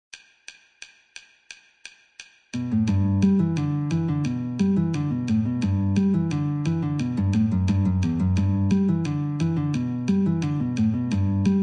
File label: Guitar met 88